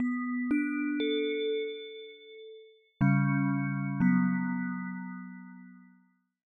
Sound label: lose music